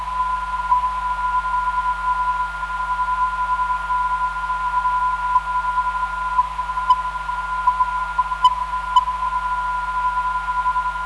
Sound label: 394kHz, NDB; JOK